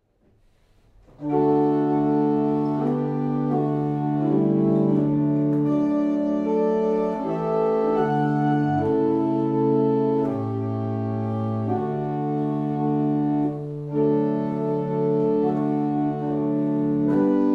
barrel organs; Steeple; University

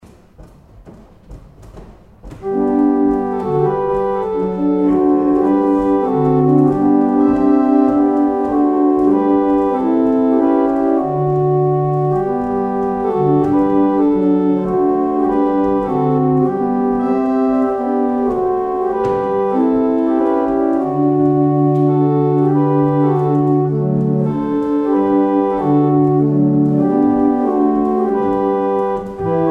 barrel organ; Letheringsett

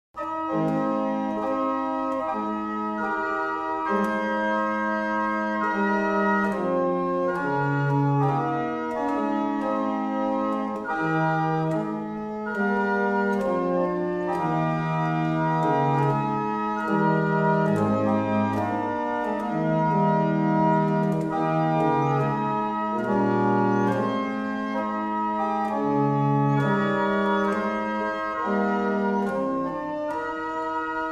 barrel organs; Tytherley; Hanover

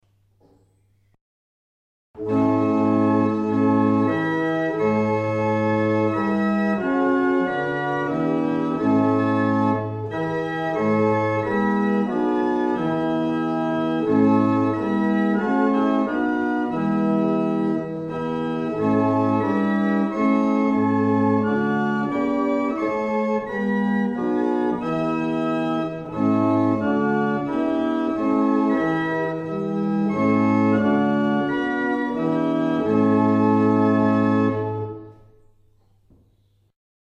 barrel organ; Mattishall

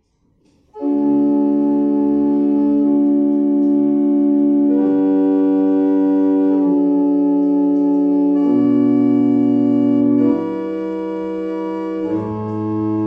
barrel organs; Raithby

